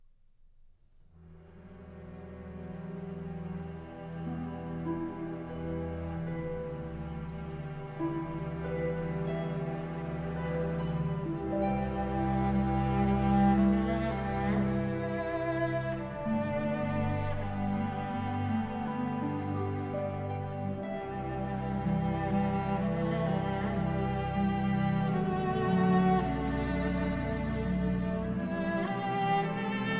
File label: Oboe Concerto, Adagio; Strauss, Richard